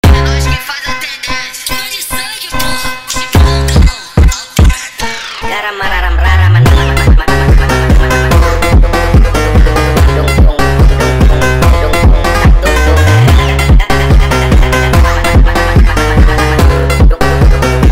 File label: Funk sped Up